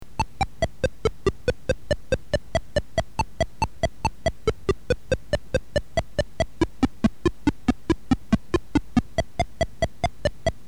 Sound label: ghost tune